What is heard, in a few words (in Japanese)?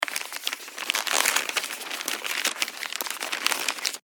紙をくしゃくしゃする